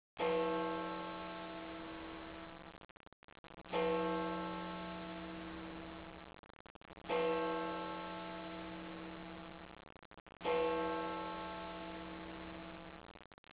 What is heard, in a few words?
bells